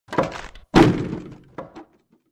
Table Sound

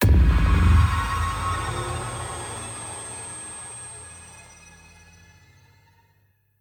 Grab Teammate; Devour; Anna; devour 2021 gamerip pc macos

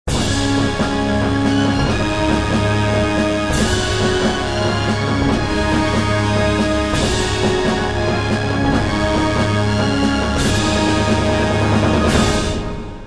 Industrial Combat; Civilization Revolution; Xbox

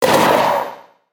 grab windup; Fight Knight

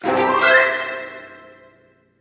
Win Game Short; Jim is Moving Out

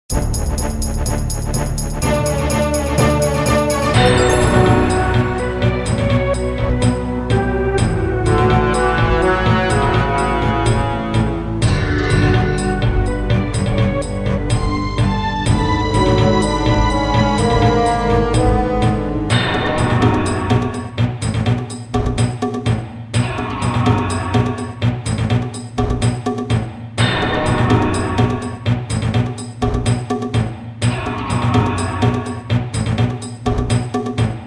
Battery Park Outtro

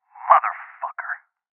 Sniper Miss

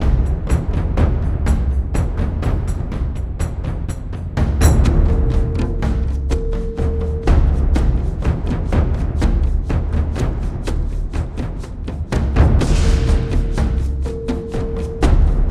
Caves Drums Loop; Ninjago Monastery; lego universe macos windows gamerip 2010

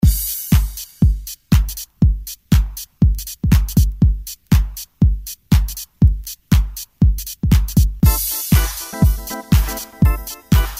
Disco; serious sam the second encounter

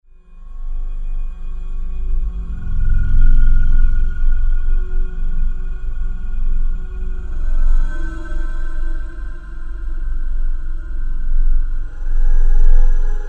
forest horror; cry of fear

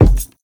Footsteps Wood Run; The Town

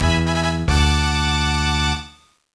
Unknown Jingle; Golden Sun: The Lost Age

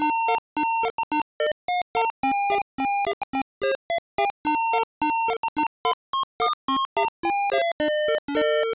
Sailors in Tavern (Drunken Sailor); King's Quest III: To Heir Is Human; ibm pcjr

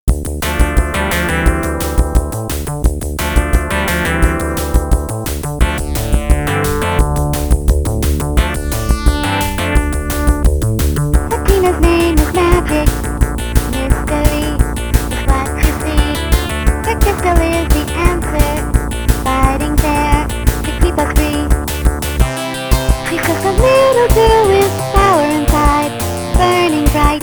Theme English Version Song; SNK Arcade Sound Digital Collection Vol. 10

